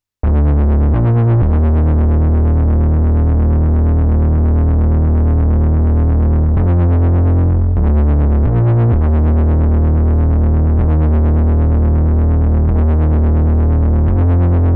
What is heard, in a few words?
bass